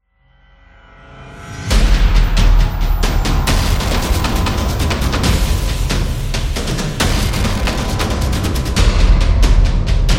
Epic War, war drums

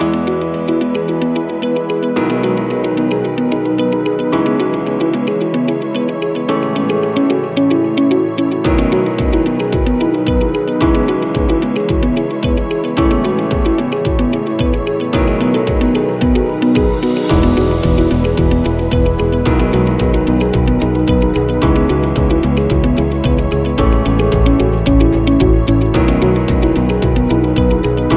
Working Background, corporate music; corporate music vol 17

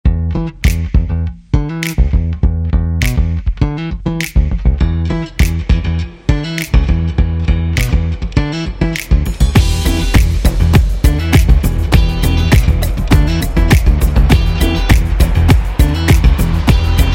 Funk Pop

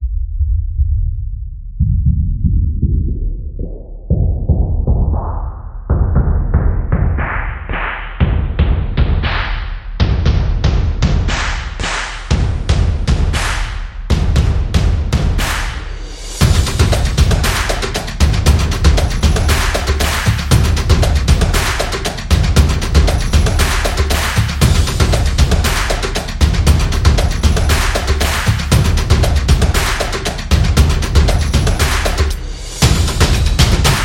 Breakdance, football music

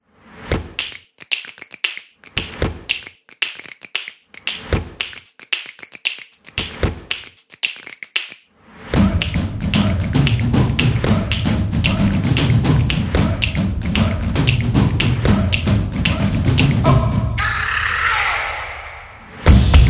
The Drums Dynamics, percussive; percussive nation vol 4

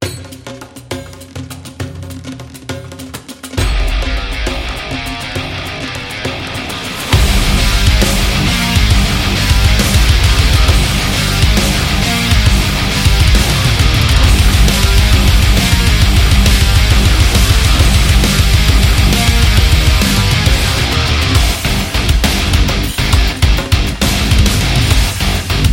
Arabic

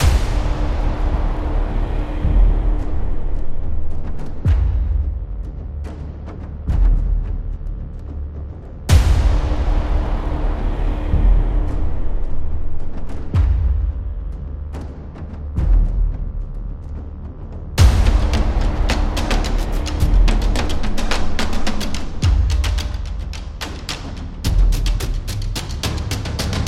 Tension Trailer

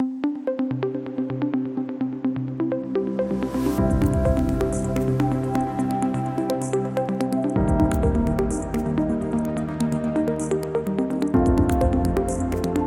Inspiring Technology, corporate music; corporate music vol 20

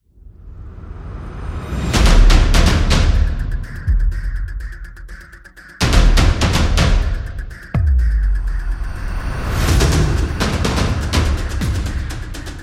In Sport, football music

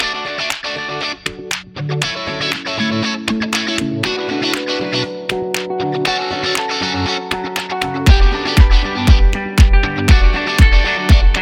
Happy Indie, corporate music; corporate music vol 18

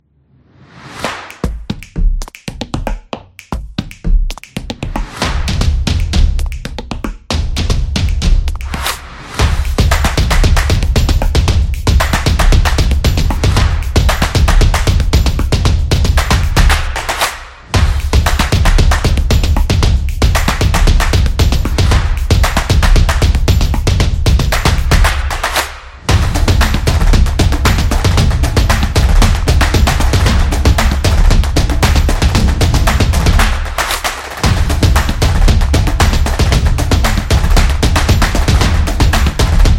Advertisement Music; drumming action

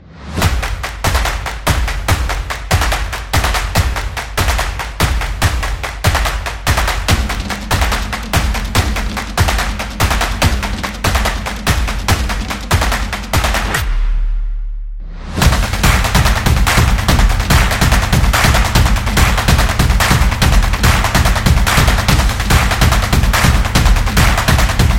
claps drum beat

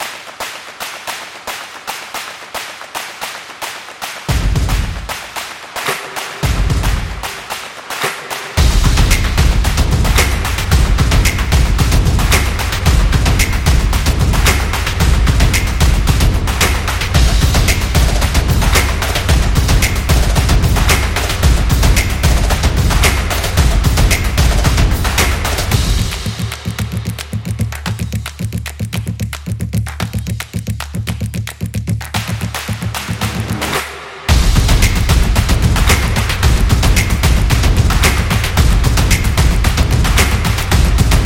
Rhythm Music

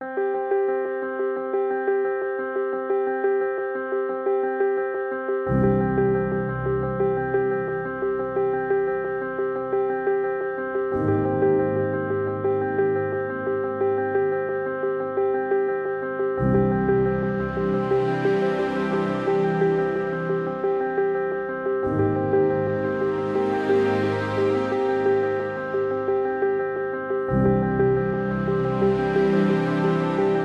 Inspirational; movie trailer

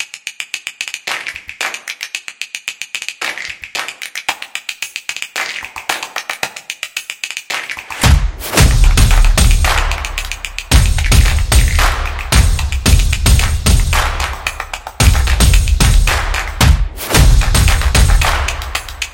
Dance Beat; graffiti

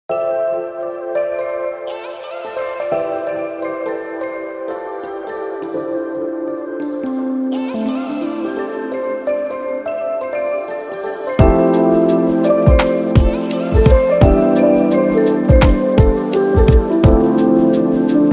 The Lofi Upbeat

